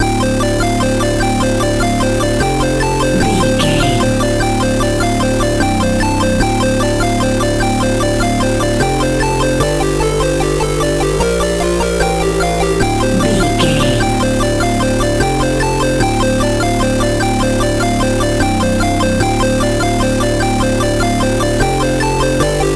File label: retro 8 bit game menu loop